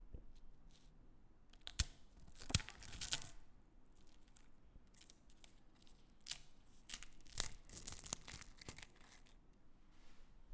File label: Bones Cracking